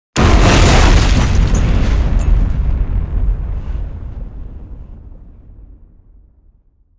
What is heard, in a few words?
satchel blast; The Elder Scrolls III - Morrowind